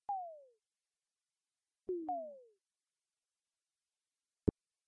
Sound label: synth; monopol